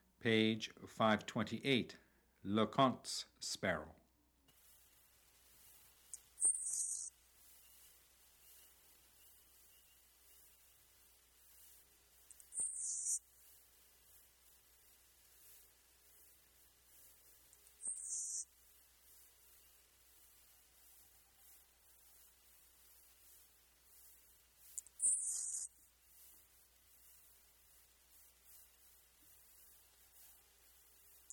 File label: lecontes sparrow